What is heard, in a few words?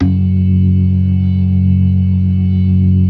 ORGAN 4 DRAWBARS; Keith Emerson Signature Series